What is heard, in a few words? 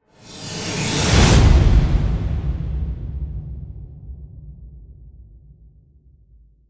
Whoosh Epic Final Title Card